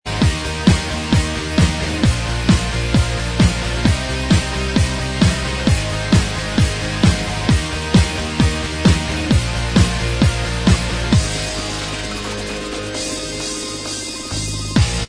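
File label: instrumental, backing tracks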